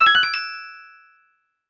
Level Up! Fanfare

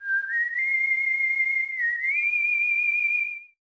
Whistle; megaman 7 original soundtrack; Protoman's Whistle

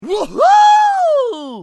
Pig laugh; Bad Piggies

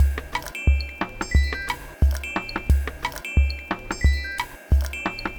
Summer Drums

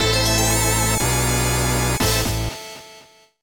Boss Jingle; Soul of Darkness; DSiWare; 3DS

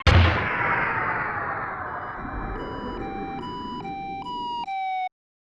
Crash! Splash! Boom!; stunt race fx

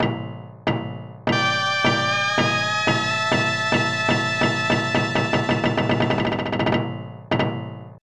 Title Fanfare; Shonen Ninja Sasuke

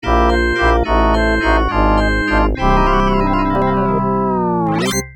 Fall Over Tune; Mick & Mack as the Global Gladiators; Genesis

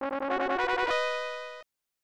Win Versus Battle; Space Invaders; Super Game Boy; SNES

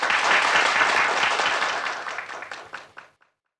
(Jingle) Cheers

